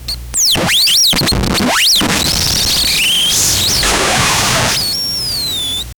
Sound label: a short vhs style distortion